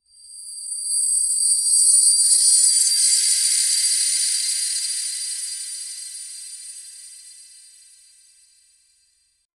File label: a swift delicate swoosh, fairy tale